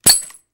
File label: Metal Shell Ejects; Small Hollow Metal Impacts. - Kitchen